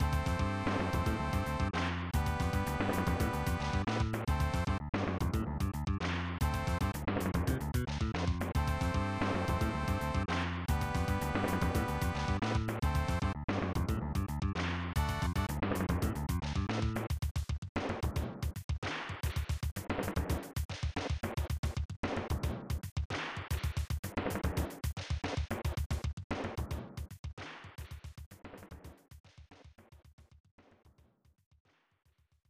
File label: Victory; Fatal Fury 2; snes